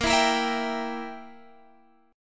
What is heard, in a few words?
E Diminished Bb